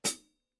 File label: formula 602 classic sounds 15 sound edge hi-hat chick; paiste